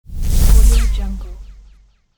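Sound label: electric transition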